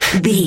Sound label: voice male breath fast single